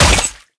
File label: hit